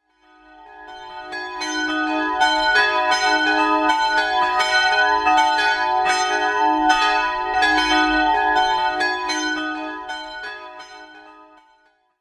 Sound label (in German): alt reformierte kirche